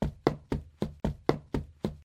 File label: Running